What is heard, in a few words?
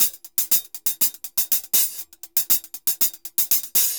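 HH R, salsa drums and percussion; Best Service ProSamples vol.14; AKAI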